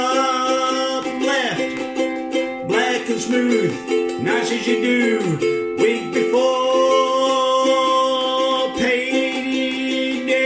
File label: busk